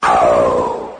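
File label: "oh", 8 bit arcade dude; Voice; 8 bit aracde dude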